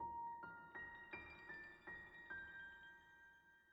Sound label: ambience sound; netent